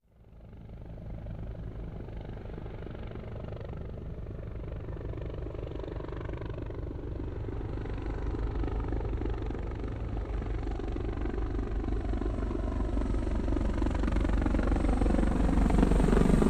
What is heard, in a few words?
CH-53 Super Stallion helicopter hovers overhead. Choppers, Aircraft Engine, Helicopter